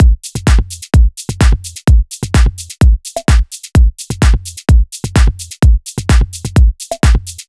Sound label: FULL DRUMS 128